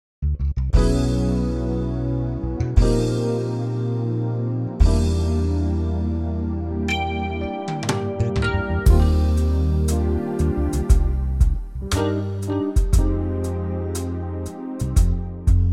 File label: smooth